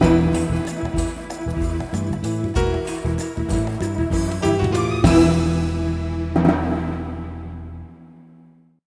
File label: Fail